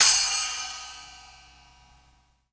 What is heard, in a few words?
Piccolo Crash; ACID MUSIC; Drums; One Shots